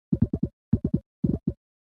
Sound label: button hover; baba is you